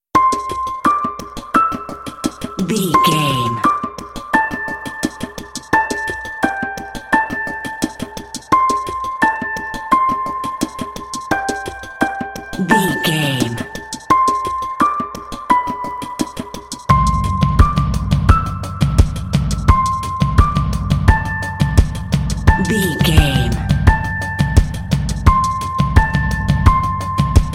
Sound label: mystery suspense ambience